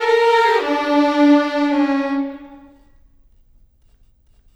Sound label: Violins, Cinematic; Sonic Foundry (Sony Creative Software); Orchestral Series VOL-3; Cinematic 11